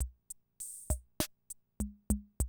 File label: CR78, DrumLoops